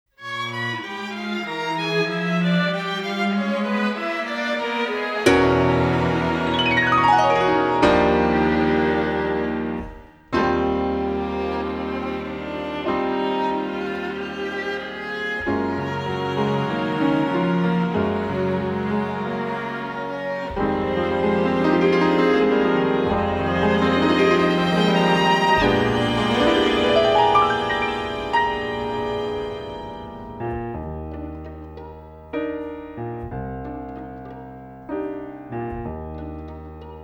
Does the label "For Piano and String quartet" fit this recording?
Yes